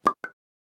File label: notification; Yota; Yotaphone-2